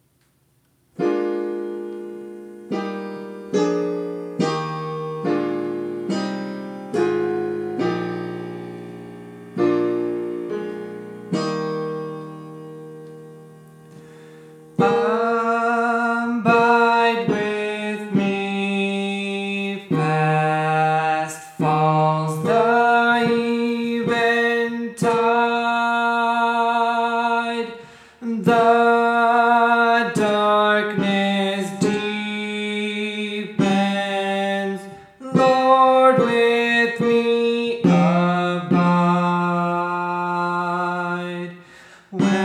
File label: Tenor